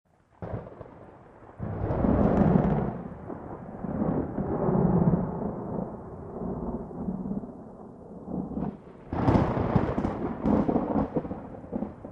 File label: Thunder Medium, cu